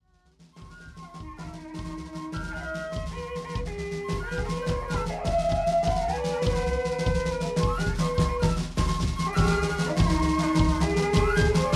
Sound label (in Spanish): Toque Turuwaynu